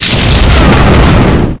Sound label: SKILL FIRE